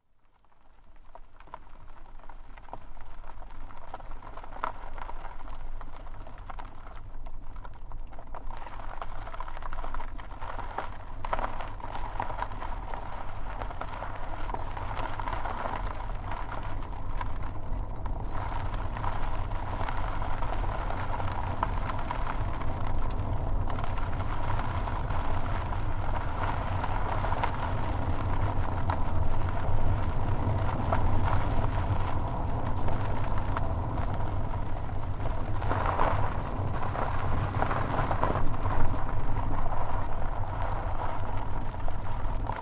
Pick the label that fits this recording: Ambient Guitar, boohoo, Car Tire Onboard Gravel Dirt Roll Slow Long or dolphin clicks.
Car Tire Onboard Gravel Dirt Roll Slow Long